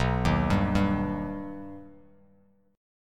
B Augmented B Bass Standard, chord; arpeggiated; JGuitar

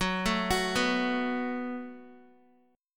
F sharp Minor Double Flat 5th F sharp down Guitar; arpeggiated

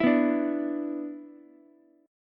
B-Minor Double Flat 5th B down Ukulele; Bmbb5 strummed; JGuitar

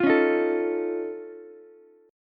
F Augmented 7th F Ukulele, chord; F+7 strummed; JGuitar

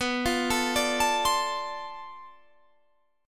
B Minor 7th Flat 9th Flat 5th B, guitar chord

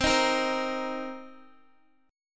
C Minor 7th C Guitar; Cm7 strummed

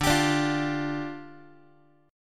D Minor Double Flat 5th, down, Guitar

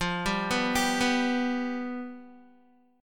F Suspended 2nd Flat 5th, Guitar; Fsus2b5 arpeggiated

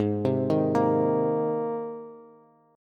Ab Major Add 9th Ab Bass; Abadd9 arpeggiated; JGuitar

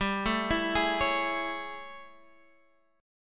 A Major 7th G; AM7/G Chord {X 10 7 9 9 9} arpeggiated

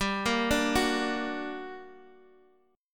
G Minor Major 7th, down, Guitar, Drop D

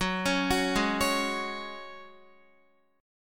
Gb Suspended 2nd, Guitar; arpeggiated

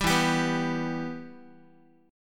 E-Minor, Guitar; strummed; JGuitar

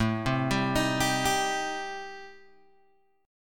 A-Minor 7th Flat 5th, Guitar Open G, chord

